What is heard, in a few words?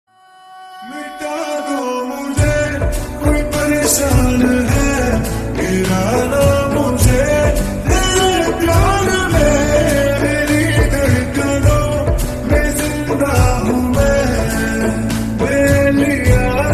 Slowed Reverb Ringtone